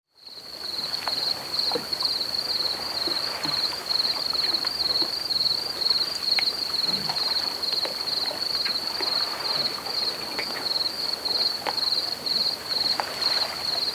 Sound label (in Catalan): Insectes Grills; Cala Tuent